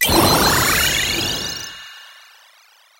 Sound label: item heal; SpaceTrain